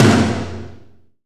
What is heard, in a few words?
Kit Heavy TOM2; CMI